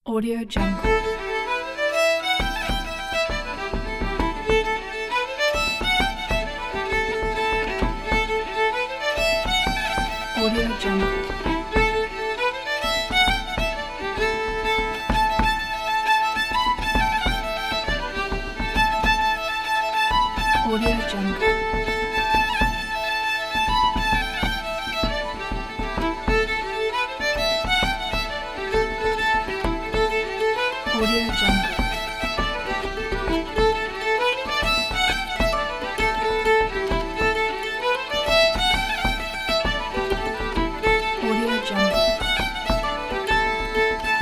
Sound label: st patricks day